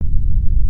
Noise Sound; Bloodungeon

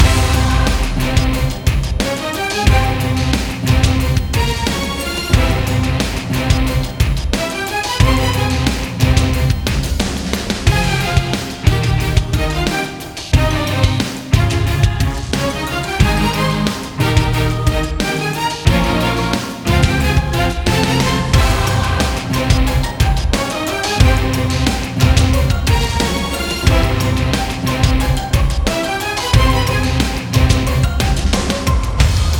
Dungeon World Boss Loop LOOP

